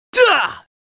「たぁ！」, スキル; スキル（シンブレイカー）

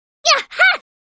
「やっ！はっ！」; スキル（ツインショット）

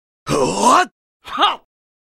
「ほぁー！はぁ！」; スキル（ディバインコンボ・特殊）

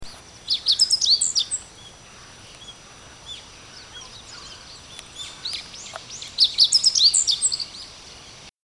hoojiro; tori

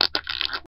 虫系モンスター？効果音

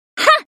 「はっ！」; スキル（イベイド）

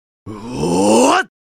「うおー！」, スキル; スキル（スタンスオブペース）

